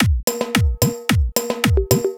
Dholki Beat

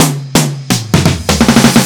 Electro Fills

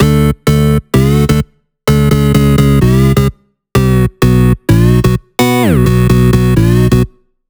Piano Melody Beat Loops

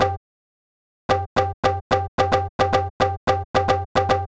Dholki Beat

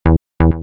Bassline

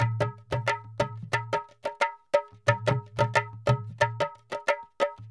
Gabra Dholki Loops

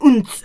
Vocal Shots

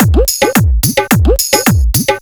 All BPM Style Dholki Beat

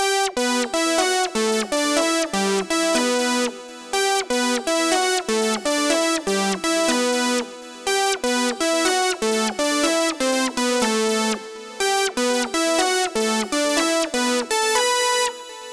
Dholki Beat

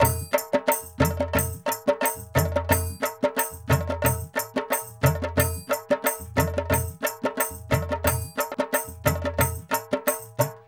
Gabra Dholki Loops

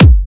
Hard Kick; Dj SES New Hard Kick Pack

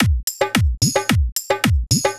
Dholki Beat

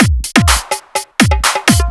Dholki Beat

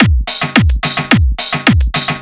Dholki Beat

